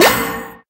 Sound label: click float metal